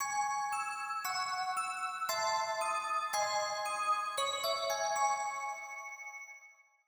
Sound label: Music Box Game Over